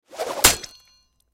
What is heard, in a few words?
Ninja Star Throwing Star Martial Arts Impact Human Flesh; Warfare; Miscellaneous Combat; Blastwave